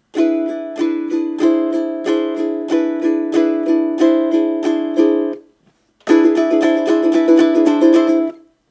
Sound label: Short Strum, ukulele